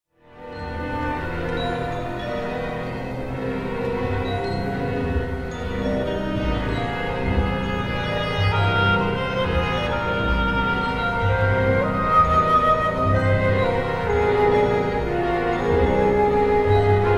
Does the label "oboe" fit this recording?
Yes